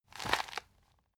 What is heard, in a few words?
plastic bag hit medium